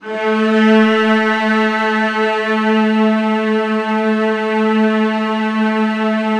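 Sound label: VIOLAS B3, Synclavier Strings; ILIO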